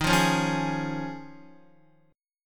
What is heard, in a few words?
Eb 7th Suspended 2nd Suspended 4th down Guitar; Eb7sus2sus4 strummed; JGuitar